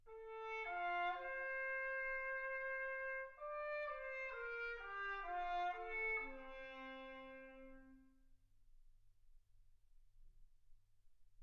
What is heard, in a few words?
trumpet